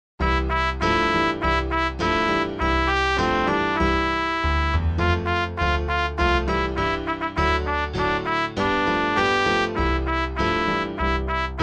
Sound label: jingle bells bb trumpet; pierpont james